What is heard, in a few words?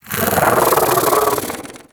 Bug Vocal Alerted; Characters; Warframe; Hunting Critters; InfKDriveBug